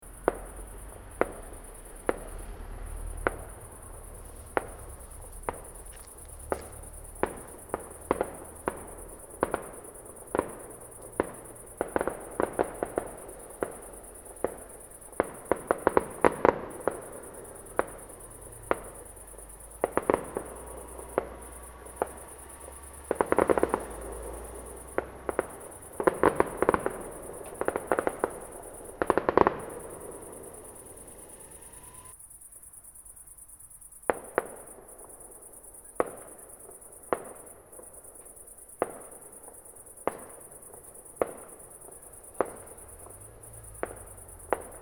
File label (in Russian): feierverk sverchki noch; saluta